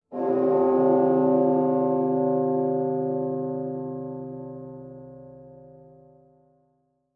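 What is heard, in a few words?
ambience bells; Blackfaun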